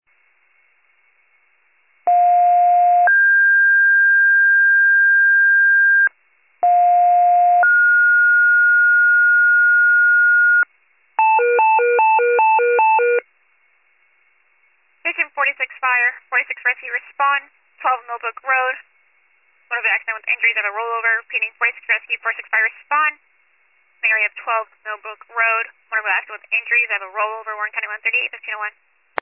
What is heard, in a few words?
FIRE pager alert